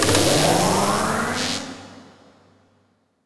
bonus; Alawar; Crusaders Of Space 2

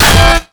melee guitar; weapons

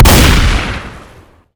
auto shotgun fire incendiary, gunfire; weapons

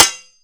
hit wood metal; Mount&Blade Warband

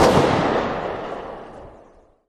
negev distant; weapons; Counter-Strike Global Offensive; csgo

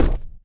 cardboard, footsteps, run; Left 4 Dead 2

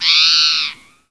single bird; ambient, random animals; Left 4 Dead 2

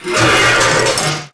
Jack Creak; AssaultCube; Alien Stars; American Muscle Series; MW Mod

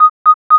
arms race kill; Counter-Strike Global Offensive

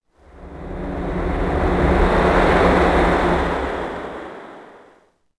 City Flyby Small; Ambient; Sid Meier's Civilization 4 Complete; Beyond the Sword

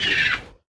Bleeder Hurt Vox; Sid Meier's Civilization 4 Complete; Beyond the Sword; Units

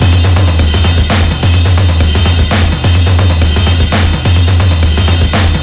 drums; Left 4 Dead 2; zombat; horde

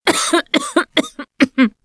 Cough, russian

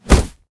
blunt hit low; Mount&Blade Warband

